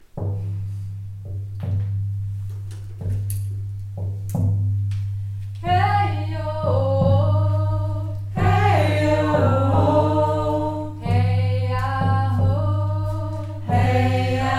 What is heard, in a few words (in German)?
Lied; Workshop „Sing dich frei“